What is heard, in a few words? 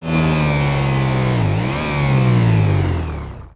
throttle off, vehicles; gmod; tdmcars; gtav; bati